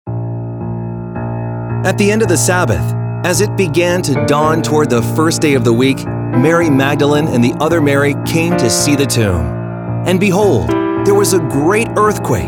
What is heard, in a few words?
Vocal